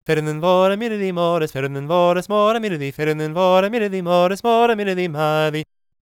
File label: GAELIC, World Voices; ILIO; Vocal Planet